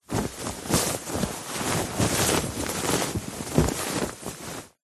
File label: clothing shirt movement rustle; zapsplat